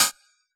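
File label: hi-hat FX, Yamaha DJX; 155 DJX Kit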